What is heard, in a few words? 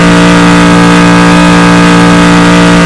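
challenger on high,car engine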